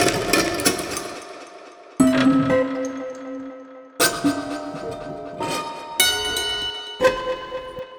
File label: Prepared Piano Loop; Sonic Foundry; Sony Creative Software; The Electronic Music Manuscript Collection